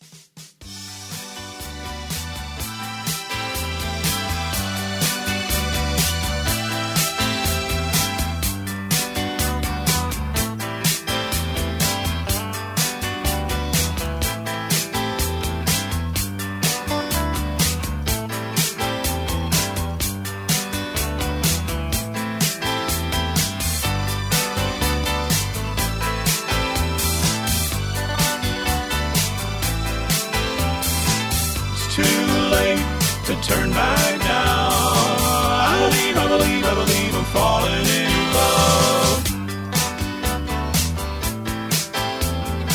Instrumental with Melody

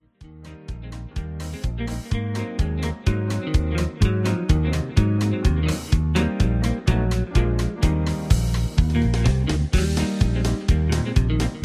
Instrumental with Harmony; Singing Calls

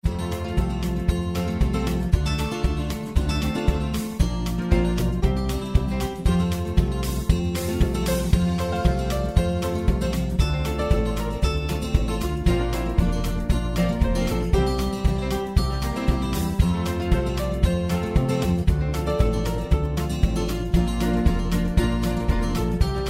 High Key

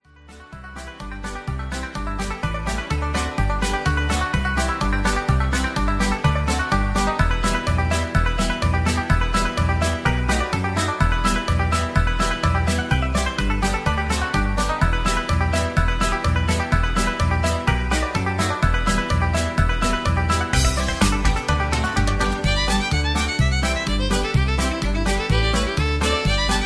Hi Hat Patter

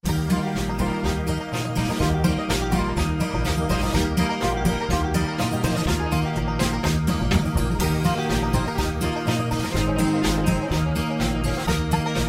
High Key

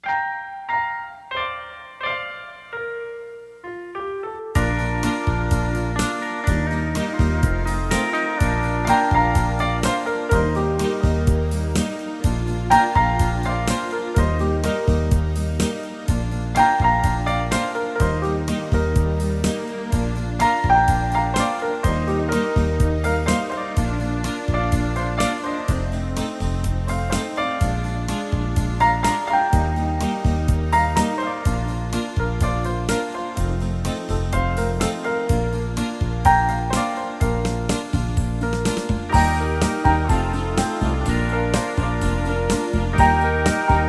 Instrumental

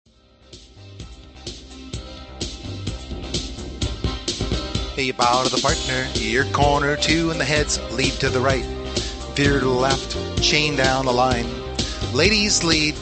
Hoedown Patter

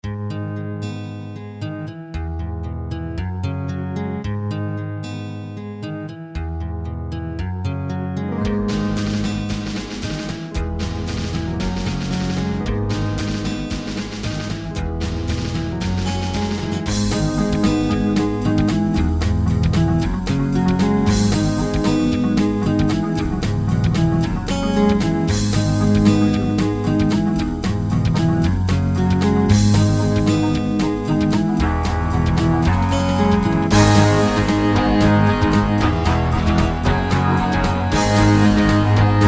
Low Key

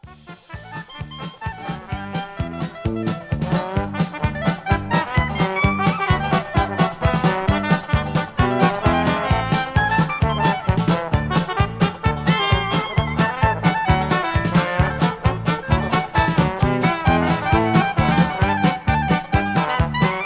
instrumental

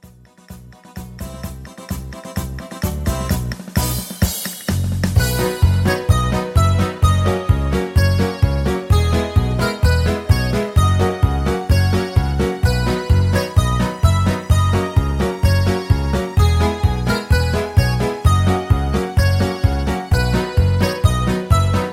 Patter

